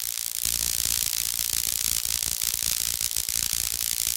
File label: Elect Arc Sizzle Bug, arcs & sparks